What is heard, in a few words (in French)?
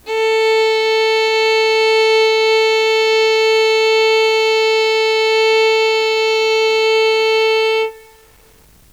violon la diapason